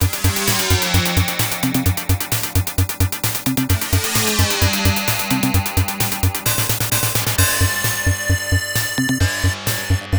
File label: Tube Music; S.T.U.N. Runner; Hard Drivin; arcade